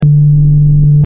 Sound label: electronic pulse